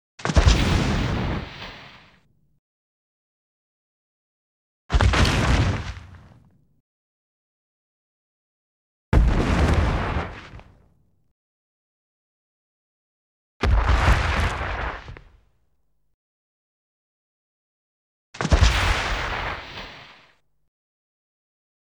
Dragon Falls, Various Surfaces; Sound Ideas; Series 2000

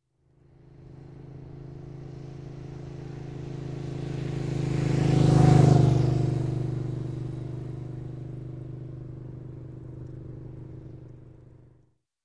Motorcycle, 1200cc Pass By, Medium; Sound Ideas; Series 2000

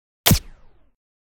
Switch Off The Electricity - Light; Hollywood Edge; sound designer's toolkit v2

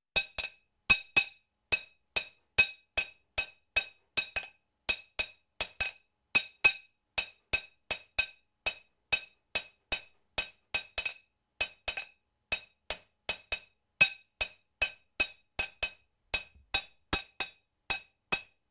Walking Machinery Trucks; Valentino Production Sound Effects Library CD50

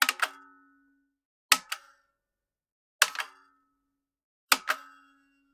Generic Button Metal Small; boom library; The Interface

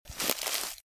Footsteps - Bush walk - Left; Eden; RadwinIsland

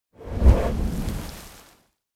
Smoke Columns; Blind Quest - The Frost Demon

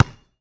Street Ball Bounce Concrete; SFX Kit

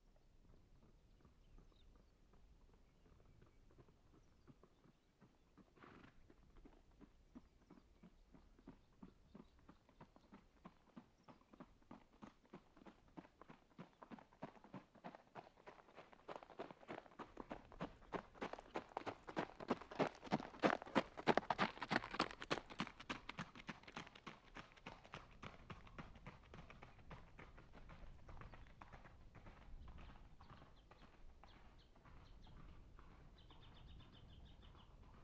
Boots running; KMP Film Video Sound Effects

